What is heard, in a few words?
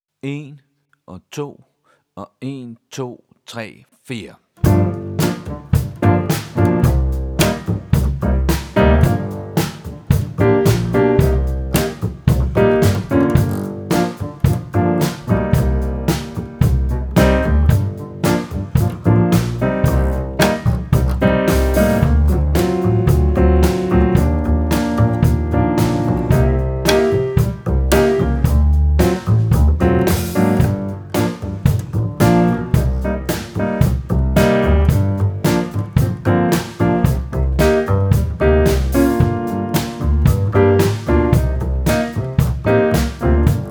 Lazy Beat inst